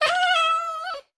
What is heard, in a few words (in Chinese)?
豹猫死亡声